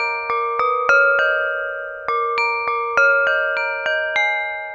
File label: Corning Bowl; Ambient; Melodic; Ambient-Melodics